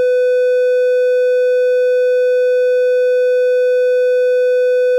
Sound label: buzzer low